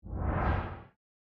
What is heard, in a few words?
zoom in; powerball evo